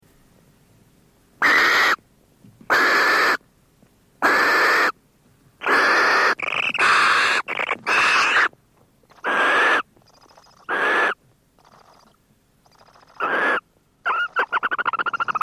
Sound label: Barn Owl; silent flyers bird display